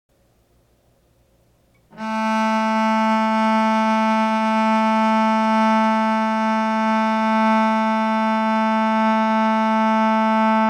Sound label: cello A; tuneguide